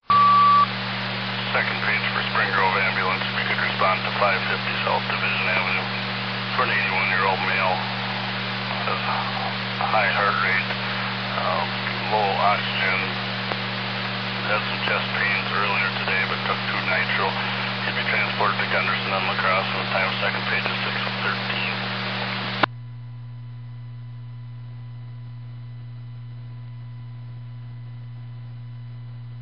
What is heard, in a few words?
ambulance page received; gold cross; osseo